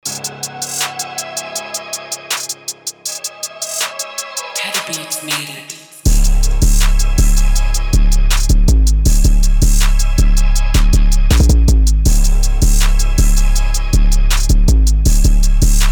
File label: beat; Pedebeats Phoenix Melody Suite; Trap多风格采样包